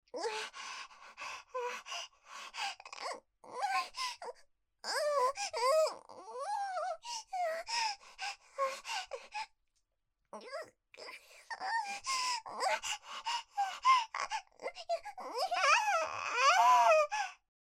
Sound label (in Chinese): 高潮前娇喘（短）; 莉露菈凯